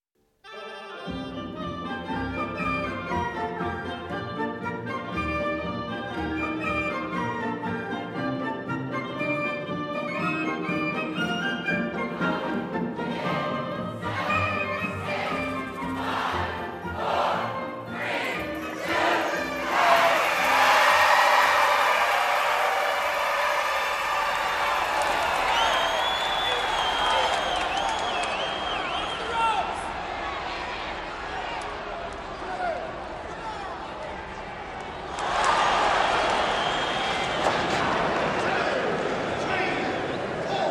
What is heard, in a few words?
Ambience, Music Hall; Aberdeen Concert Hall; Reverb from The Aberdeen Concert Hall